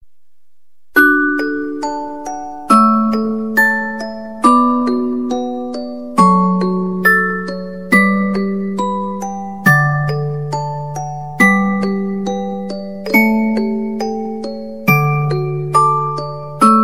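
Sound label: canon in d music box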